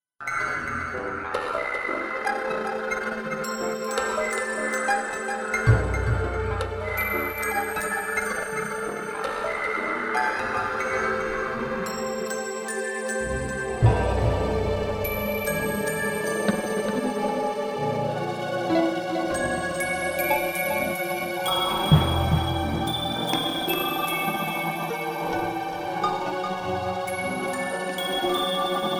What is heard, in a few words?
Horror; Shimaigusa